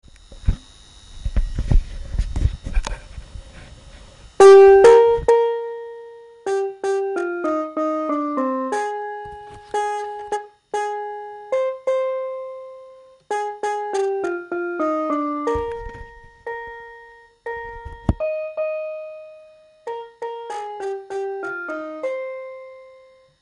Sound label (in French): refrain piano